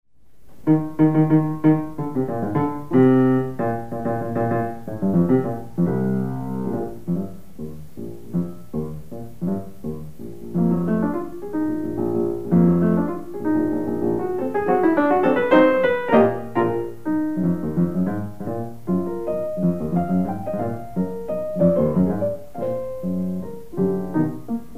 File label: mazurka; szymanowski